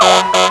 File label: short air